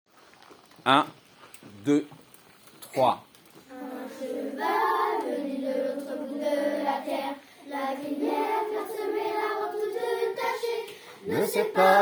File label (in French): couplet refrain; chansons du spectacle l'Eldorad'eau